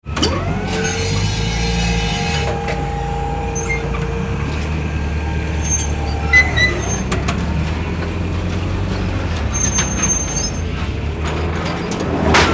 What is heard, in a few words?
Forklift digging worksite operation